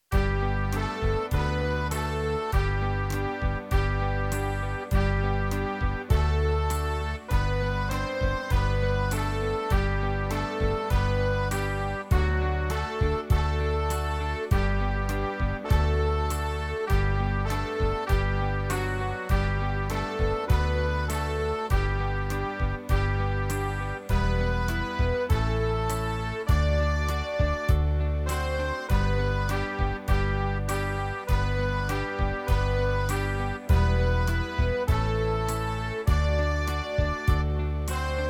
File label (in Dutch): Trompetfeest